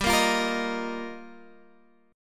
Eb Suspended 2nd, G; strummed; chord; JGuitar

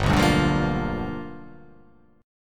G 7th Sharp 9th G chord

